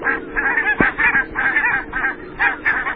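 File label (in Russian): крик перелетных птиц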